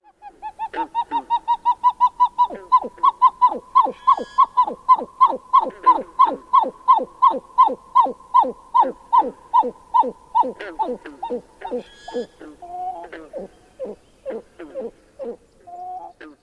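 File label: song, Pine Bunting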